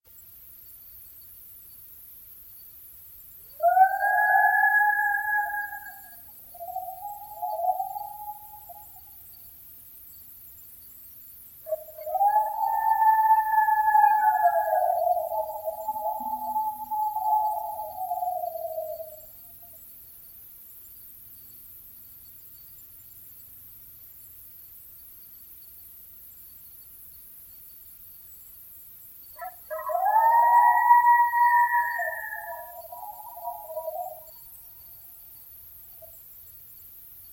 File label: coyote; NPS